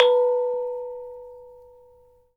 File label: G#4, GAMELAN SET; Spectrasonics; Heart of Asia